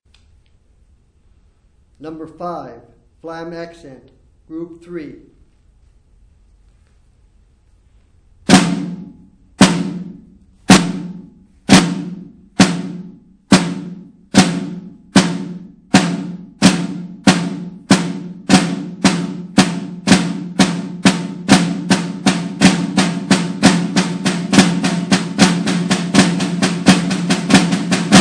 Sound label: snare rudiment